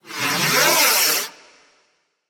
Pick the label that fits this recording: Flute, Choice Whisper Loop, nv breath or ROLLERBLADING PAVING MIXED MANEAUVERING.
nv breath